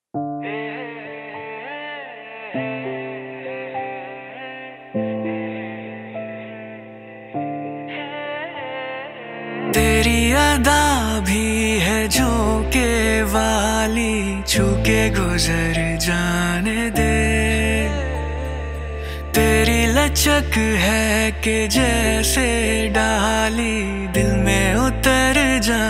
hindi cover; fanaa

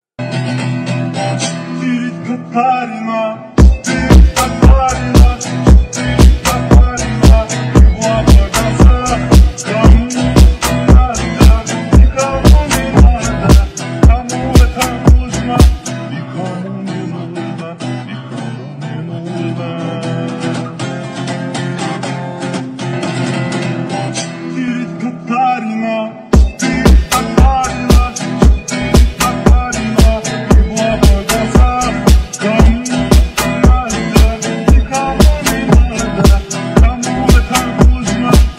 dance remix

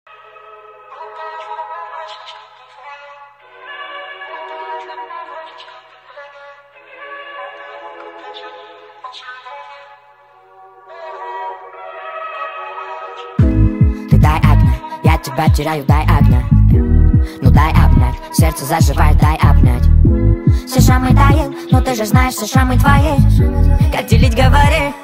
speed up remix

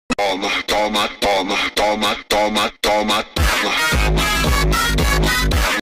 funk phonk; neymar; football; cr7; messi; futbol; memes

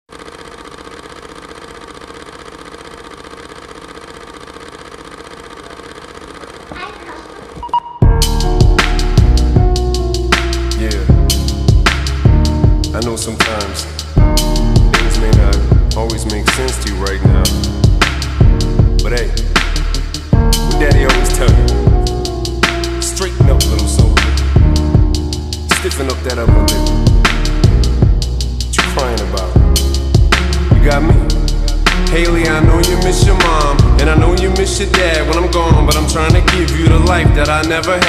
slowed reverb

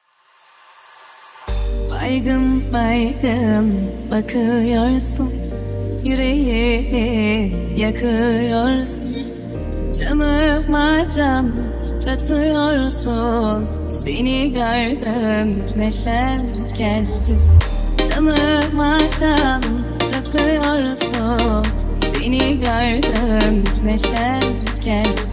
remix

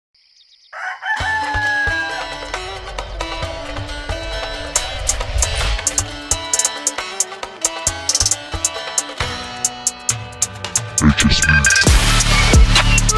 drill; keloglan

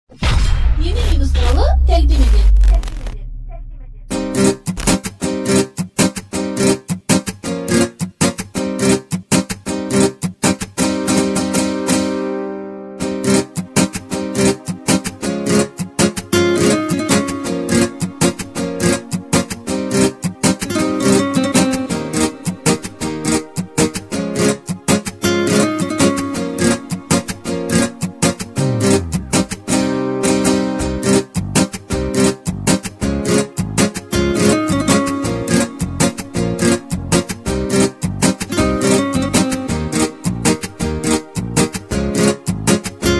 karaoke

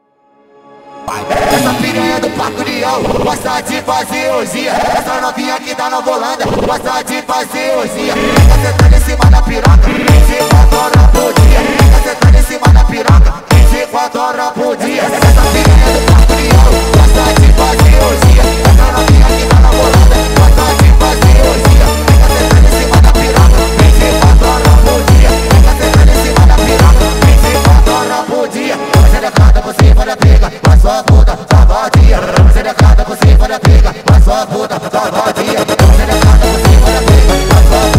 purple brazilian phonk

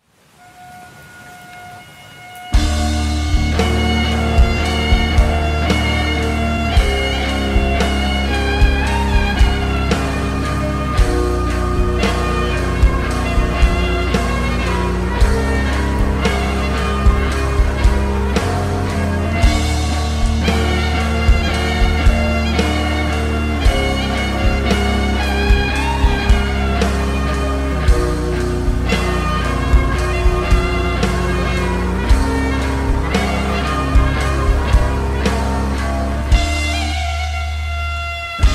slowed reverb rain

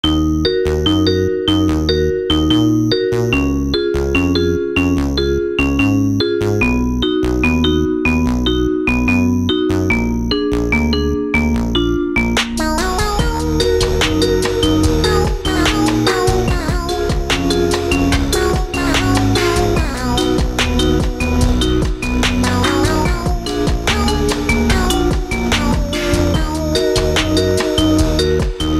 Elevator Jam Remix

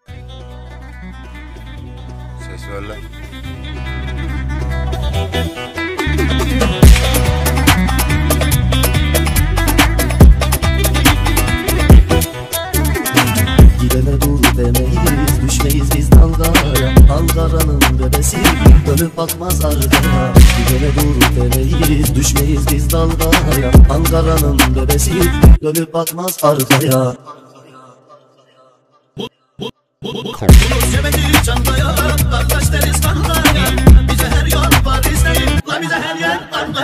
drill remix

